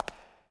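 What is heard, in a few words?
reverb walk, footsteps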